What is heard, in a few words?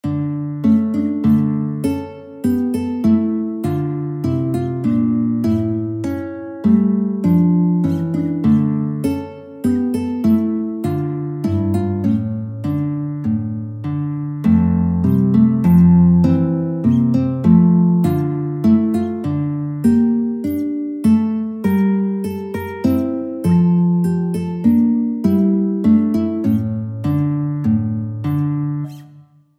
ayre, guitar; purcell